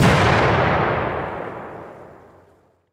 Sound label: explosion